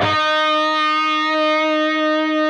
PRS E, PRS LEAD, Guitars; Spectrasonics; Hans Zimmer Guitars VOL-1

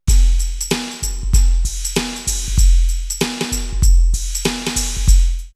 R8 Timing, DrumMachines; X-Static Goldmine

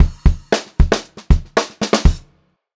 POP FILL, Pop-Rock Drumloops; 118 POP

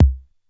BD Eight snap, DRUMS; Polyend; Legowelt

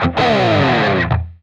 PRS SLIDE, guitar

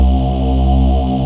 CHORALIS, DANCE; Pro-Rec

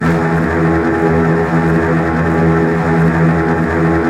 Virtuoso Strings, TRM FX

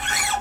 Gtr Squeek; Mo' Phatt; Digital Sound Factory Mo' Phatt

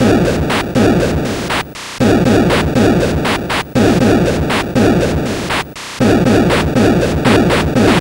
Atari 2600 Noise Drums

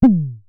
Synare Filter; Star Instruments Synare 3

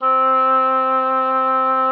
OBOE C4; Xsample VOL-6

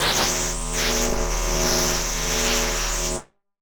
Casio, Glitched, malfunctioning synth

